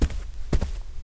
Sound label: walk